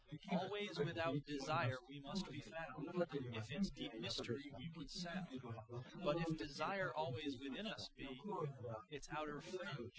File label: male inst sim; SiSEC08; SiSEC underdetermined